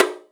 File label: shime, Percussion